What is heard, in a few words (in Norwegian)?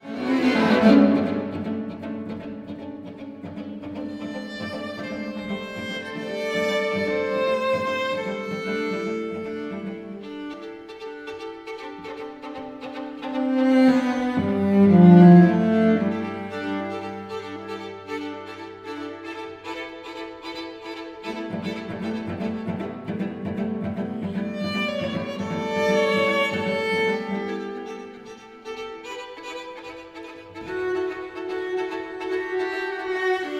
Udelt takt